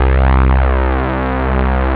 bass string; ST-XX Amiga Soundtracker Sample Packs

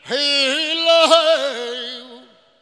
FLAMENCO VOX; Best Service; World Colours

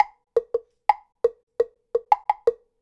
Temple Block, Latin Percussion; Sonic Foundry (Sony Creative Software); Temple Blocks

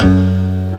DONG SOUND, Synth FX Misc

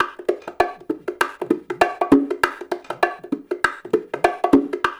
loop, bongos

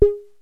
YAMAHA, DRUMS PERC; Rubber Chicken Software Sample Bank

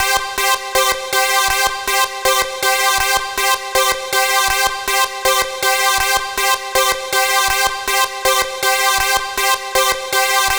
Dance Synths, Hardcore Loops, Synth Loops

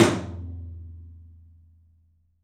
OPEN TOM; 3D Sound; 3D Drums

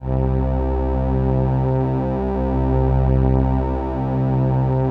LAMBDA, String Machines

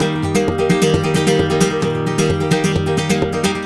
130 D# Rumba; Spanish And Gypsy Traditions; Soundscan vol.59; AKAI

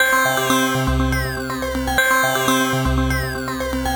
NRG Arp, trance

